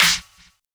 Snare, Drum & Bass

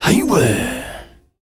HIEWEH, World Voices, AFRO; ILIO; Vocal Planet VOL-5; AFRO NOISE

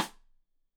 Side Stick Stereo; Deeply Sampled Drums; SMDrum

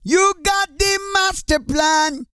REGGAE, Vocal Planet World Voices

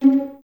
Violins C#4 Pizz; Miroslav Vitous; 11 Violins

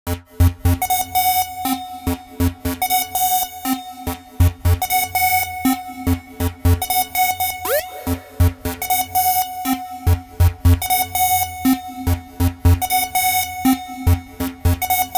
Techno Synth

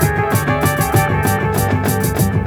Dub FX, dub hits; Sonic Foundry (Sony Creative Software); Ambient Grooves

